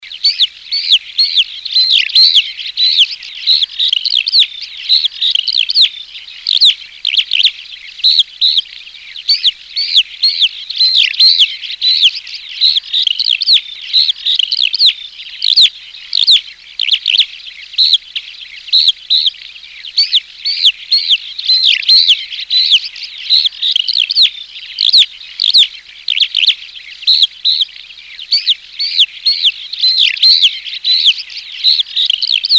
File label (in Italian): ALLODOLA; CANTI SAG NATURE 2024